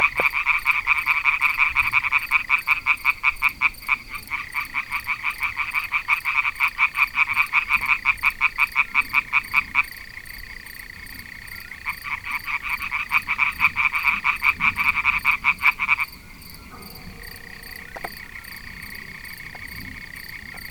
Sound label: Hyla molleri; Saint-Symphorien